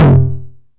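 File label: 909 high tom tape saturation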